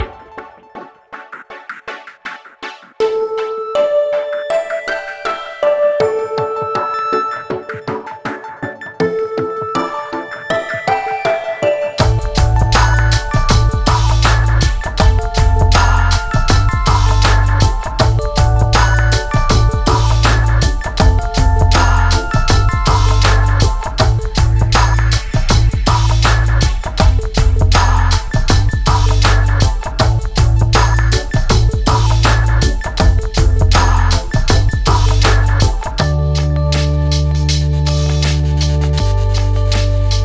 Music Mainmenu, MainmenuBGM; Smart As...; PS Vita

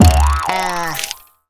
China Hit Sound; Jolly Battle

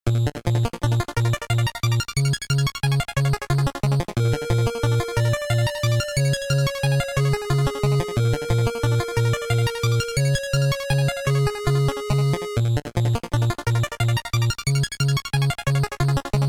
bgm brisk

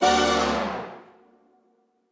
Flashover

